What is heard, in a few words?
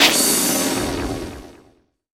NOISE, Production Effects